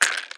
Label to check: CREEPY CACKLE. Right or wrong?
Wrong